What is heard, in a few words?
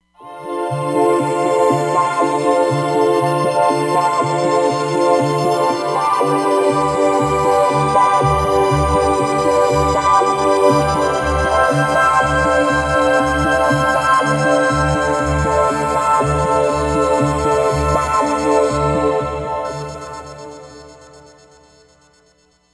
Filtred Dream, Roland Fantom XR